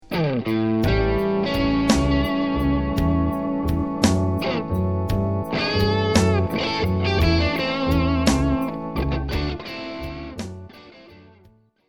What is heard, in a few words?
a7 lick, guitar; land band